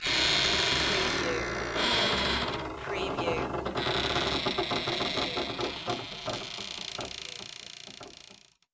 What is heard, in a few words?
Creak Door or Coffin